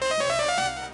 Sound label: Spell; DQIV iOS SFX